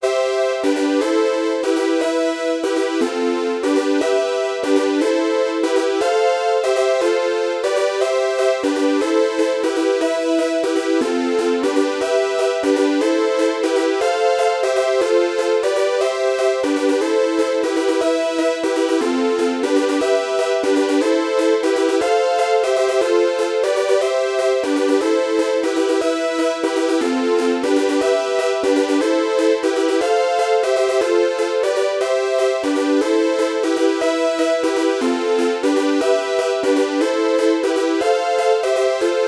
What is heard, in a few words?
classic trance